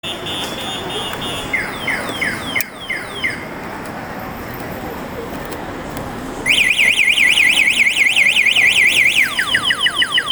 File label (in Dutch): Scooter geluid; niet zo irritant hoor